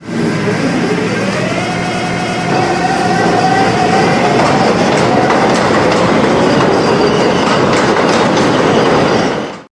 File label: Motor, Train; R110A